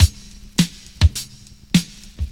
104 bpm high quality hip hop breakbeat sample e key